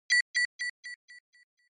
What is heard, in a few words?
Short Accent Single Delay